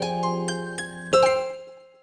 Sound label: Jingle Normal; tamagotchi connection corner shop ds